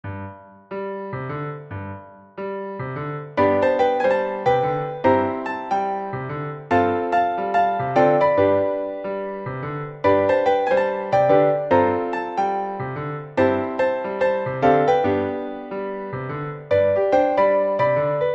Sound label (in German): Klavier 4 Haende; Besetzung: Klavier zu vier Händen